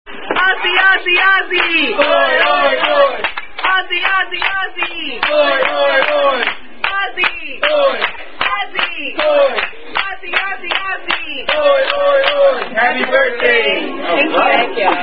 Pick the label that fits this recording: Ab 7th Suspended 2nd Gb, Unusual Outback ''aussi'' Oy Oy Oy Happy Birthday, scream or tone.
Unusual Outback ''aussi'' Oy Oy Oy Happy Birthday